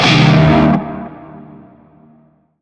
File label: Shawzin Blues Chord; Warframe; Narmer Shawzin